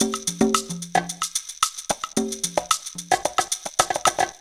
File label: PERC LP, PERC LOOPS; Zero-G; Funky Elements